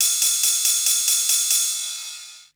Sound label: TECHNO CYMBAL 140